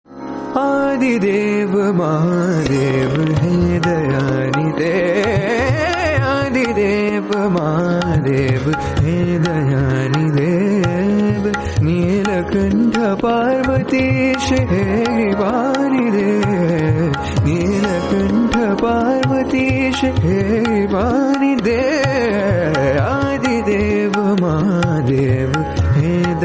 Raag Yaman Hindi Song

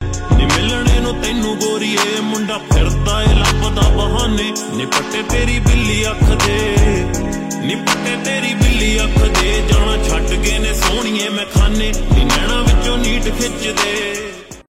Hypnotic Ringtone

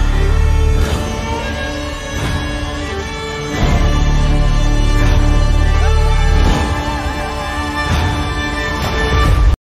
Trailer Bgm Ringtone; Manjummel Boys

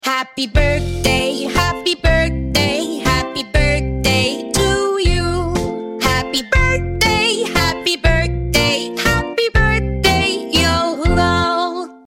Happy Birthday To You Baby Voice Ringtone

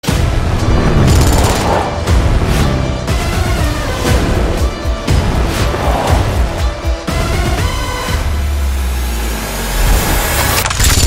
BGM; Tiger 3

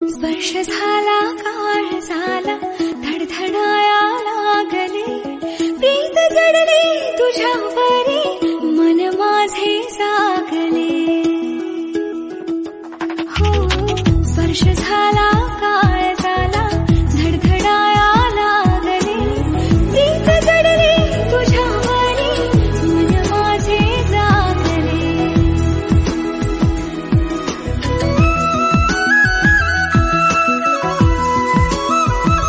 Marathi Song

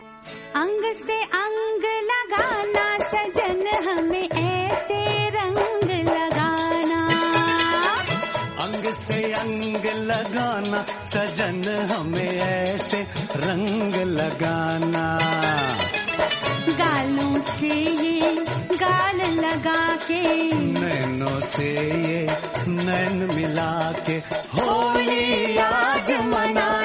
Female Ringtone

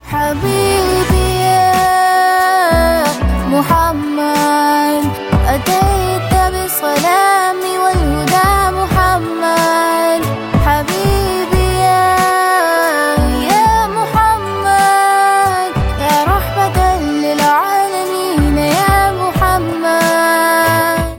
Female Ringtone

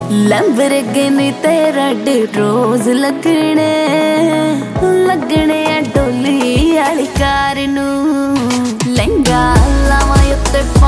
Song Ringtone

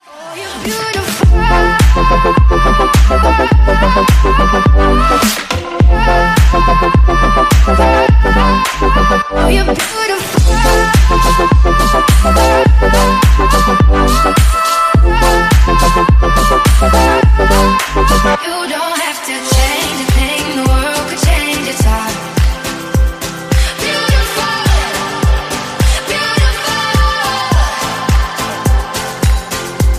Bgm Ringtone

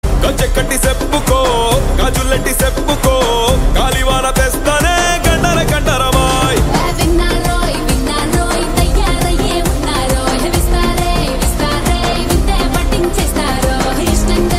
Telugu Ringtone; Skanda

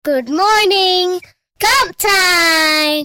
Good Morning Wake Up Time Ringtone

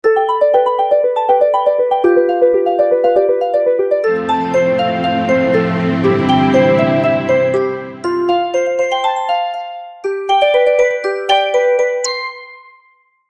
Romantic Engagement Ringtones

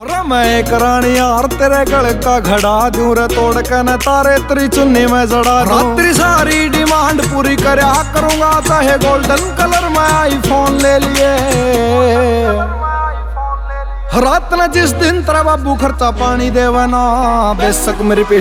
Song Ringtone

